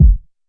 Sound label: classic hip hop kick; Classic Hip Hop Mega Kit